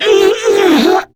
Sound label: Death, Female